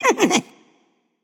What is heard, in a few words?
heh heh; cupid